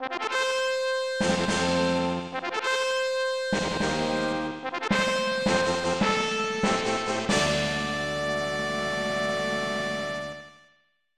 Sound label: Fanfare